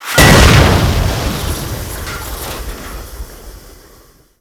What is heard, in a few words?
Metal Explosion; Warframe